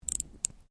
Watch Clockwork Wind, Click; Clicks; Objects

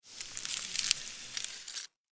Science Fiction Ice Freeze Super Power Short; Battle

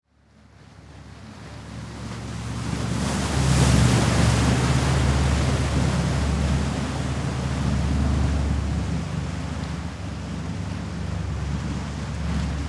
Jet Ski Approach, Watercraft, Idle, Drive, Off; Transportation; Boat Sound Effects; BlastWave

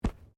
Body movement Organic Impact Light

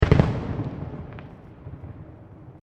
Fireworks Multiple Explosions

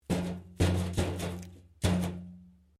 Pipe Tin Move; Metal; Sound Effects:Materials; Foundation3

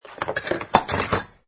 Knife, Kitchen knife dropped into drawer; Household

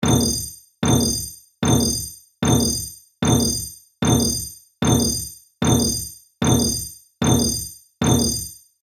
Hit And Sparkle Loop; Magic Sound Effects; Andrew Fleming

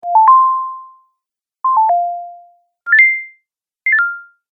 Approved App Sounds, beep

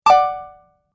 Message Alert, beep

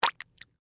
Drip Goop Splat; Texture; Dripping; Objects; BlastWave

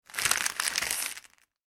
Plastic Packaging Movement; Plastic Packet; Materials; McKinney Sound

